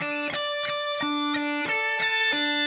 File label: Shift Delay, Guitars; Rock